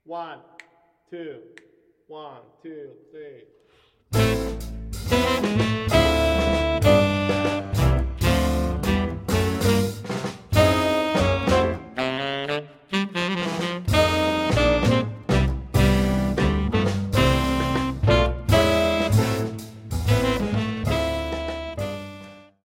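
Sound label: minus trumpet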